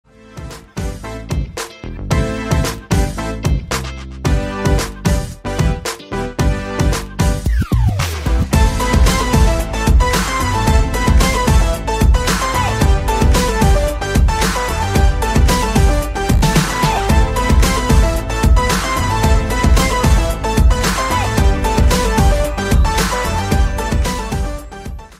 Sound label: FLUTE RINGTONE